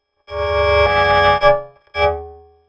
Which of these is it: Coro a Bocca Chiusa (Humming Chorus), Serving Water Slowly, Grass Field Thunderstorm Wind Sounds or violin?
violin